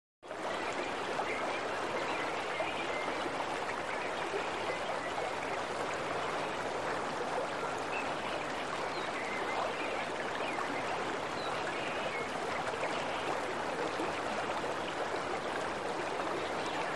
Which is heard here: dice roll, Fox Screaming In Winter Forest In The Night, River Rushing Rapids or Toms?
River Rushing Rapids